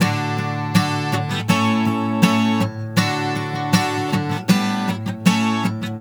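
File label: 160 D GUITAR, Celtic; USB Soundscan vol.42; Celtic Flavours; AKAI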